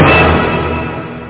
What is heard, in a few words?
Orch; Now Pop Quiz